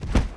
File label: fall; reaper; giants